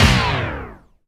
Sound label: a5, Dance Kit, EMU E-III; ProSamples vol.55 - Retro Sampler